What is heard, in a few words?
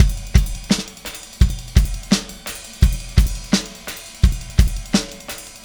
Static Beat; Sonic Foundry (Sony Creative Software); Indie Rock Anthems; Session 13 - Static